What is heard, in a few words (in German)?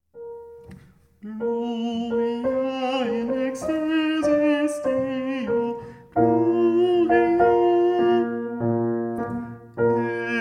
Sopran